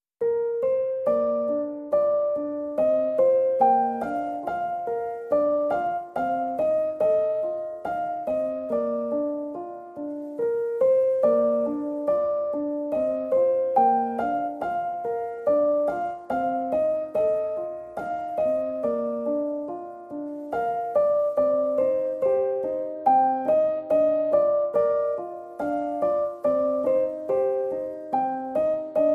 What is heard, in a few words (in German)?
Weisst Du Wieviel Sternlein Piano